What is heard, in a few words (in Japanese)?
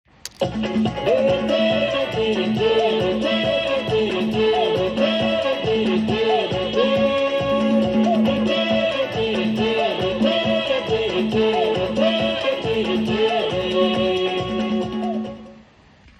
みんなで歌うところです; この音はオリジナルに近いものです歌い終わり方が最初のは上がって次のは下がります